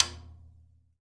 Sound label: FTOM TAP, drum